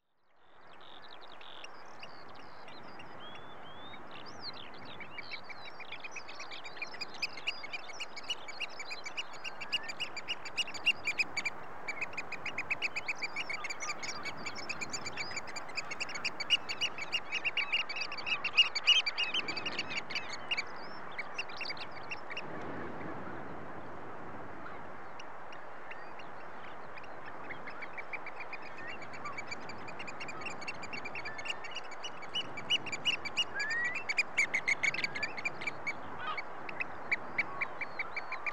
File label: Oystercatcher calls, with skylark and heavy sea in background; British Birds; The BBC Sound Effects Library